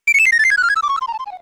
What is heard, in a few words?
enemy runaway; SNES; SMRPG